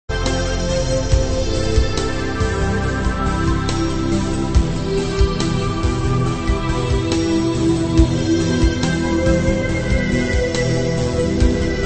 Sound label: Synth Sample